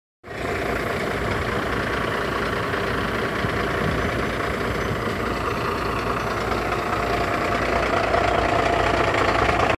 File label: Renault Midlum 270 Truck; کامیون رنو میدلان ۲۷۰